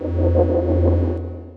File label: cloaking; assasin